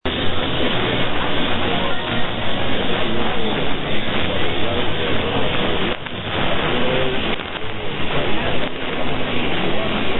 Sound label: Radio Caribe Cuba, radio